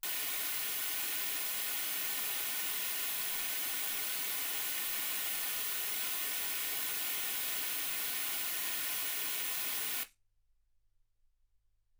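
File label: white gaussian noise 48kHz; single mic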